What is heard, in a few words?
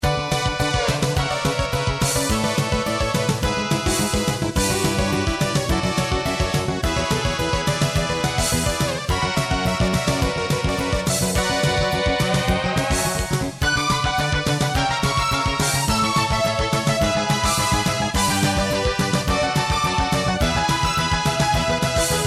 lead sample Computer sound